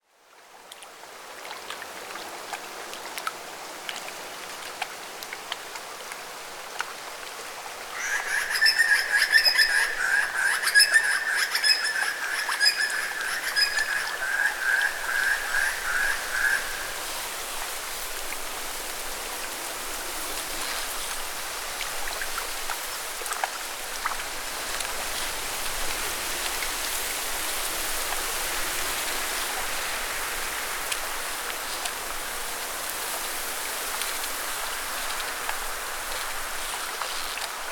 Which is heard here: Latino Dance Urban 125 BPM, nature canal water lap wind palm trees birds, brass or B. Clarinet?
nature canal water lap wind palm trees birds